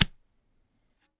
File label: "Re", tabla; Mango Loops